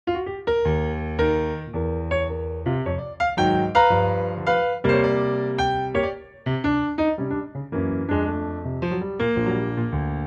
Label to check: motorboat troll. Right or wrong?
Wrong